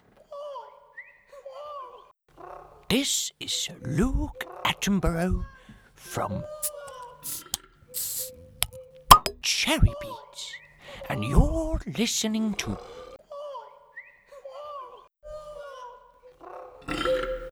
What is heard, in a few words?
Radio Jingle